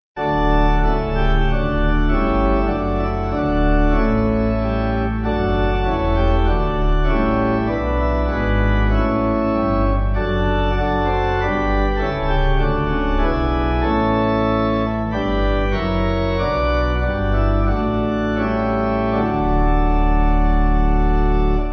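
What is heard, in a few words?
Pipe, hymn backing; Savannah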